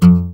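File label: GUI Ac Dmp F, acoustic guitar; Best Service Gigapack 2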